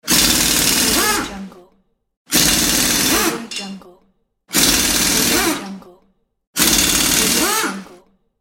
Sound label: impact wrench